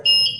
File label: Genfare Farebox Audio